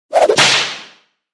Whipcrack

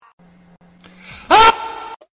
cut scream; made with Voicemod

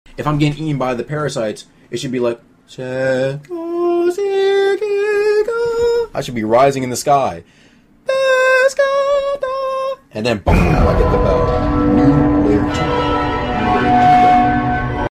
BELL; THE NEW LAYER 2

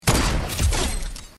Headshot; Fortnite

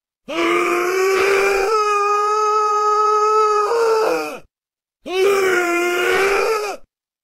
Crying meme sound; Soyjak; sadsoyjak

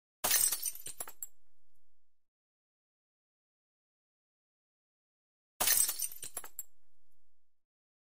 Glass Bottle Break; breaking glass bottles sound effect

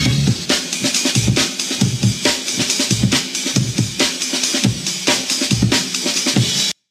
amen break; meme

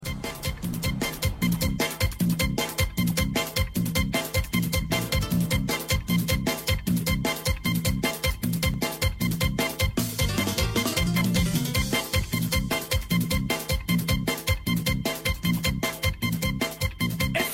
taxi song

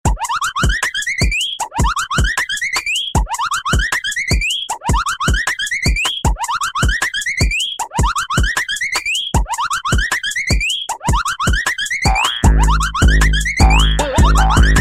goofy ahh beat; Voicemod

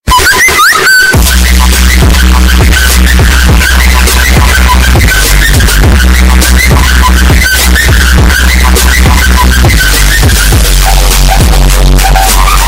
ear rape; whatsapp

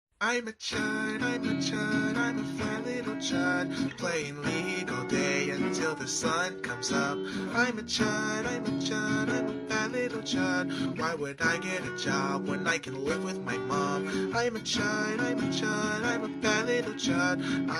song slowed and reverbed; fat little chud

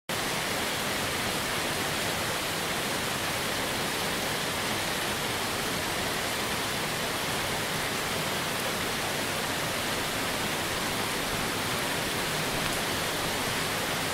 Rain Falling, nature

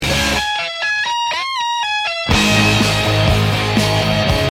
riff

